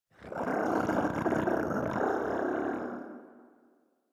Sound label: Catbrow Combat Vocal Idle; Warframe